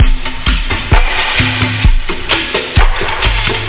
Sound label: jungle exotic house loop